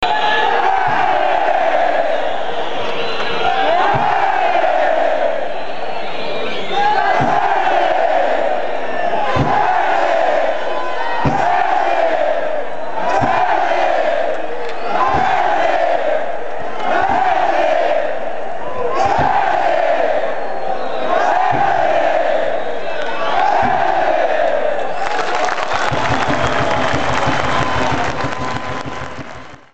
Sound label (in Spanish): grito de la alegria, chants